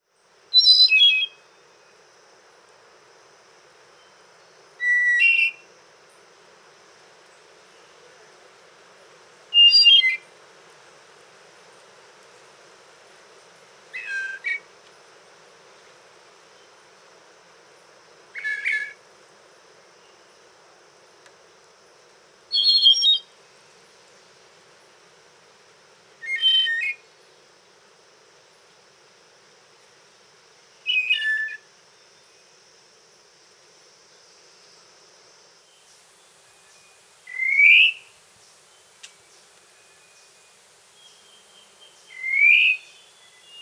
Song and calls, Birds of the Costa Rican Cloud Forest; N. America; Natures Songs